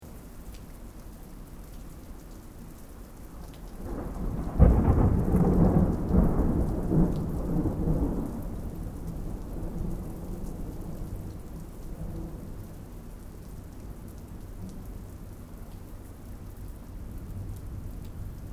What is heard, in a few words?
Thunderstorm City Background Sirens; Elemental weather effects